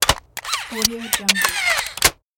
mechanism robot

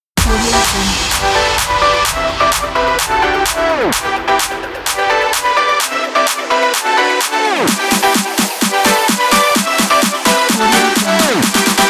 edm

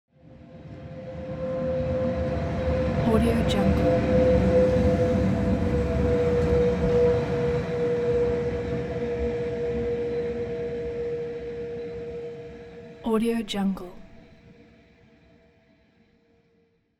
transportation train locomotive drive by hum

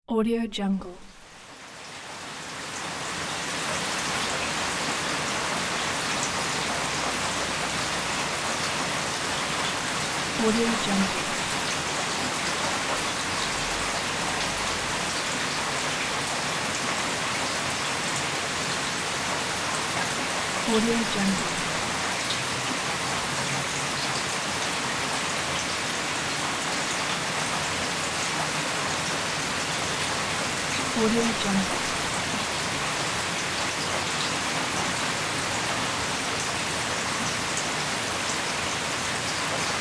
heavy rainfall

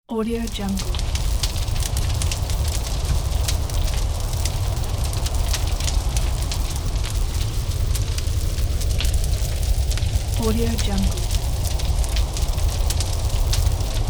fire and wind

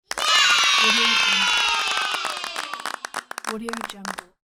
children cheering yay with applause

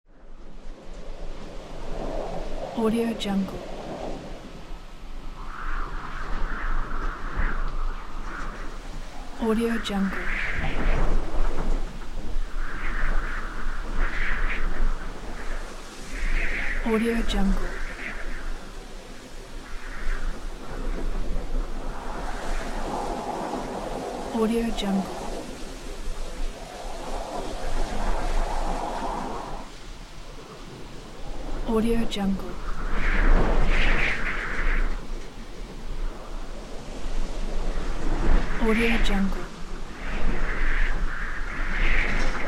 wind

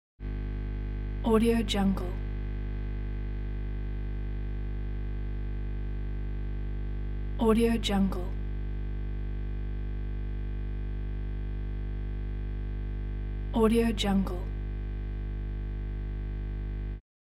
amplifier cable noise

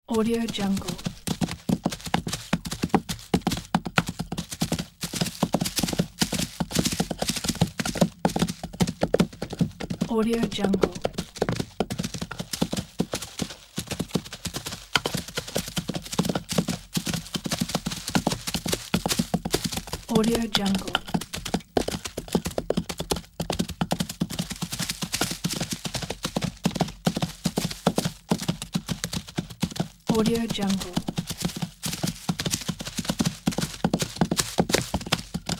wild herd animal galloping; sound ideas

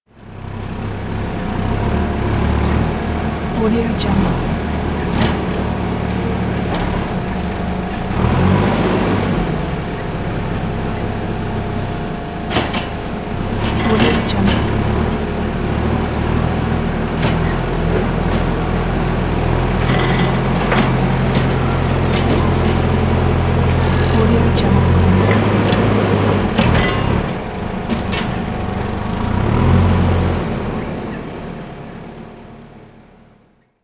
construction bulldozer; soundfood

